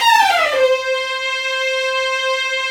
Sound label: STR, Vls Runs; Roland L-CD702; Vlns Bow FX